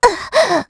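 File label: Vox Damage, Korean; Estelle; kingsraid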